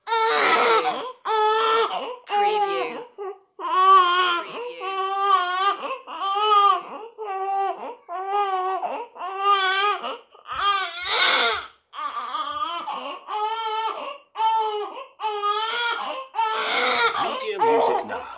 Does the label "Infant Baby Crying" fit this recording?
Yes